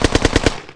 pillbox

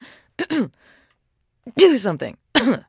trader clear throat; 商人杰恩（七日杀）

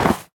snow golem hurt

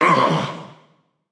hurt

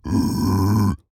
piglin brute idle; 猪灵蛮兵（我的世界）; 猪灵蛮兵是守卫堡垒遗迹的攻击型猪灵变种。

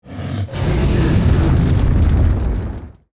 Frostwyrm pissed; 冰霜巨龙（魔兽争霸3）; 亡灵; Wyrm Breath; 冰龙吐息

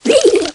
skeleton deploy end

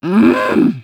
Bombardier hit

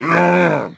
hurt

